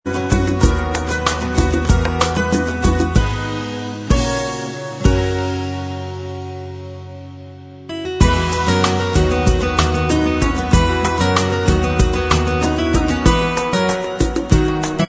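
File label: bossa version instrumental track, backing tracks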